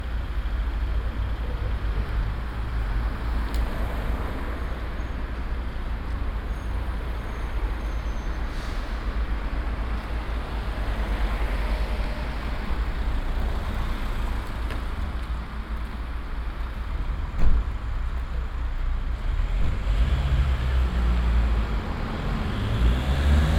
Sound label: Traffic